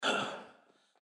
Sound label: Jump; GGJ2022